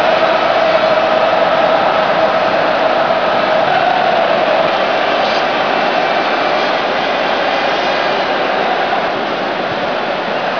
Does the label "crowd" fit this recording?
Yes